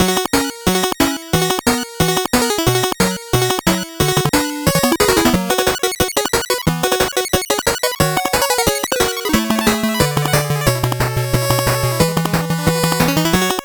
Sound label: 8bit bgm fast